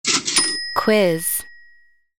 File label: cash register; Eco-quiz